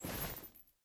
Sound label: armor short; smite; hera